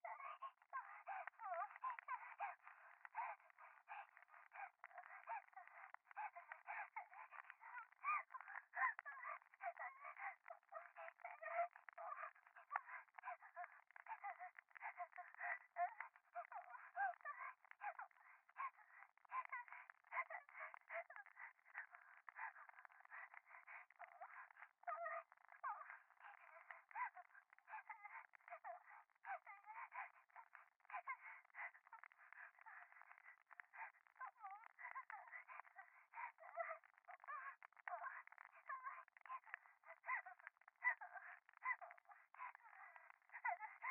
可愛い喘ぎ 暖炉

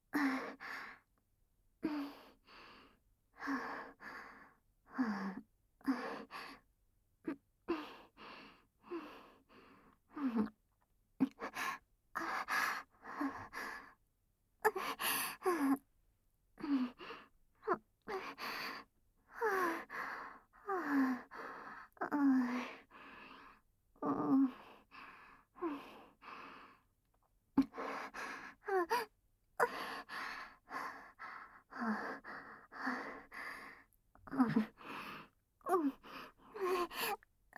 事務的ビジネスエッチ・クール喘ぎ

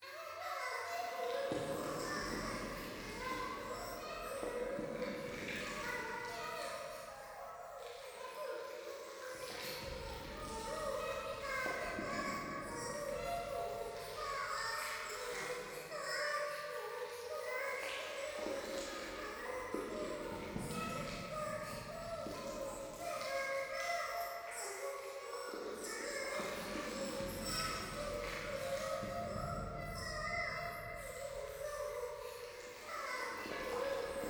教会 可愛い喘ぎ 触手

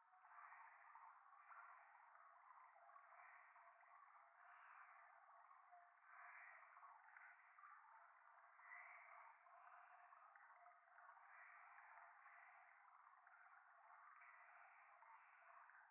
右耳 密着 吐息 川のせせらぎ; 夢の中

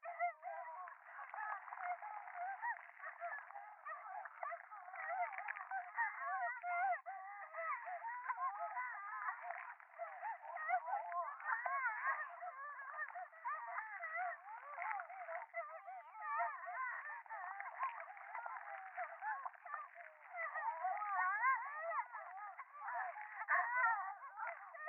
電話 喘ぎ 触手

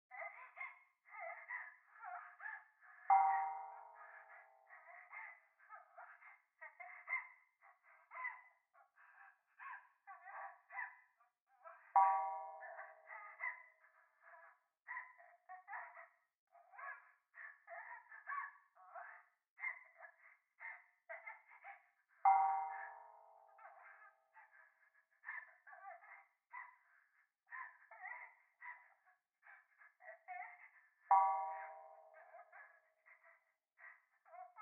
風呂 喘ぎ

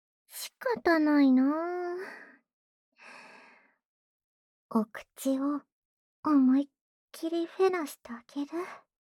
ツンデレ 喉奥口フェラ; フェラ素材

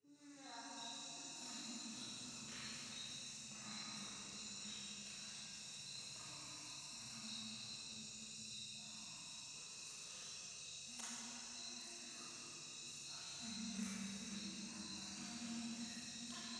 廃墟 フェラチオ 山奥夜

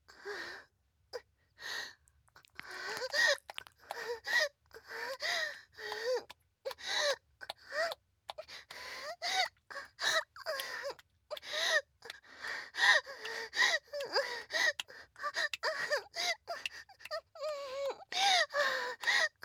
挿入 喘ぎ; SEあり

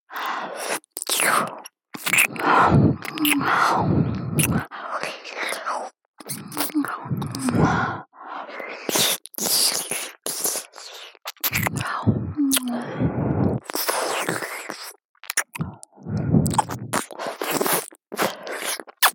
白衣の天使の左耳舐め, ASMR; SE有り

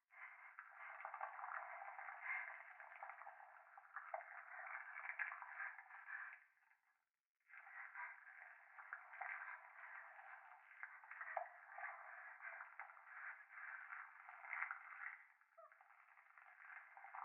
風呂 左耳快感吐息 触手

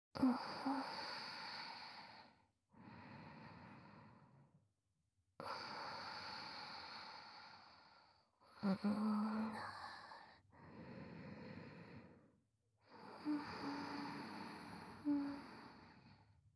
寝息; SEなし

